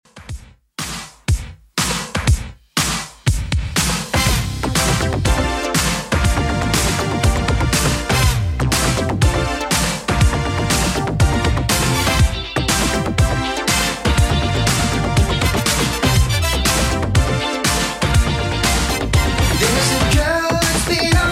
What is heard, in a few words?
80s Redrum 121 bpm